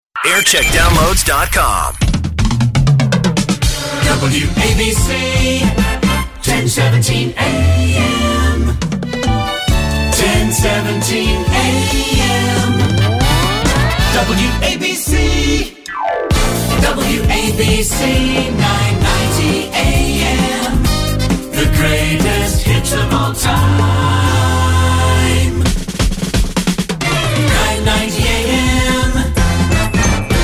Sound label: Jingle Montage; WABC Wolverhampton; JAM